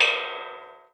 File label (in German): metallklang; klang im schnee